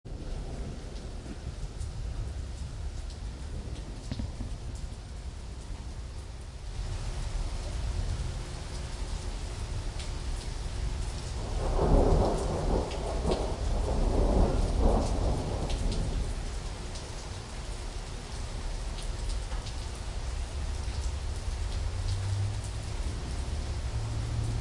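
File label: thunderstorm phoenix arizona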